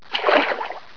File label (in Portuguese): agua, Sons domésticos